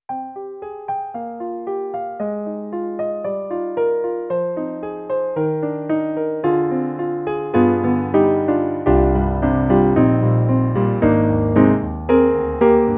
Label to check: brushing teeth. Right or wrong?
Wrong